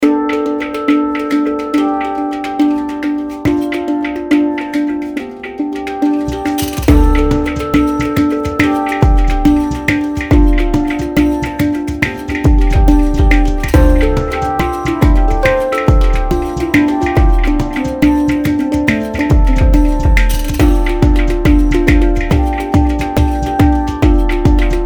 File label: handpan; gio israel; sacred instruments; handpan vol 2